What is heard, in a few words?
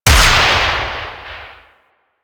Heavy Shotgun Projectile Explode; Weapons; Warframe; Tenno